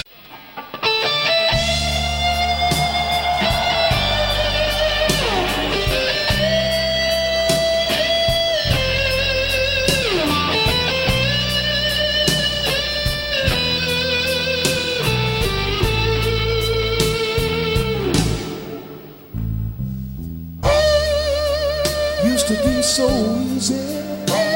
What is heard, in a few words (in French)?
Guitare; Artistes locaux